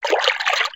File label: water